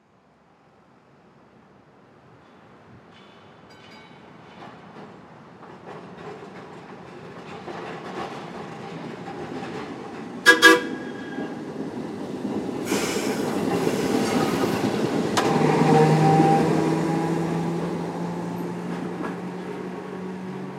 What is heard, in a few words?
Trolley Drive By, Trolley Drive By Whistle; Transportation; Whistle Sound Effects; Whistles (Sound Effects:Objects); Blastwave